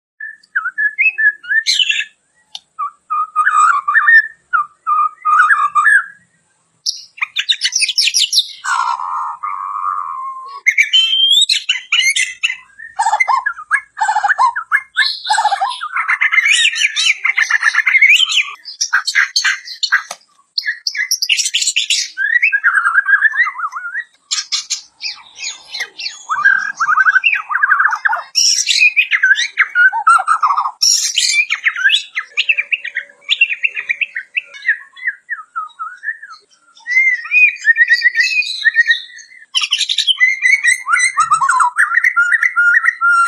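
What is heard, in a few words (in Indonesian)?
suara murai batu kepala putih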